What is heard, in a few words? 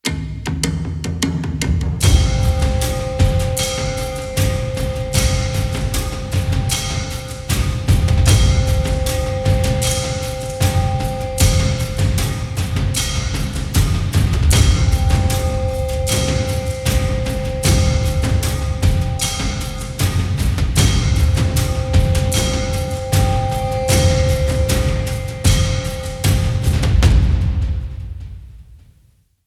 Ambient Night; Eidolon; Cetus